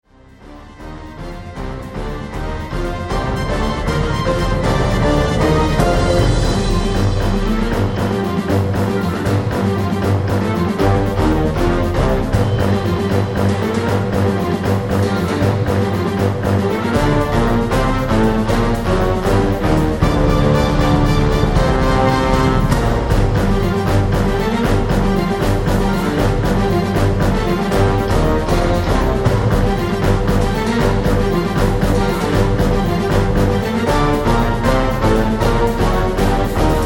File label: BATTLE Fanfara